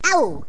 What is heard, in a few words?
auw; Raft Wars